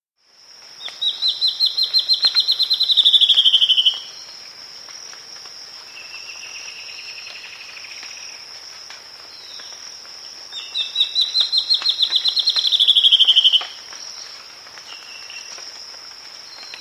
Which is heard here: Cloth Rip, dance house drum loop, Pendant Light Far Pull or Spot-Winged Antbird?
Spot-Winged Antbird